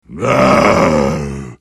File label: tiny anger